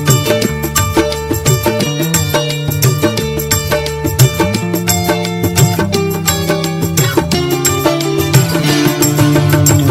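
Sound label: Hindi Love Song Guitar Ringtone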